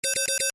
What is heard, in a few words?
Alarm, High Tech; Alarms; Electronics; loop; Alarm Sound Effects; Stuart Duffield